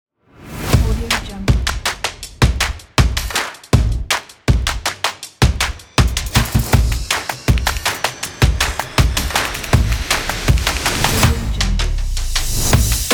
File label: the drum